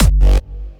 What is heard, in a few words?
Hardstyle Kick Reversed Bass; Harha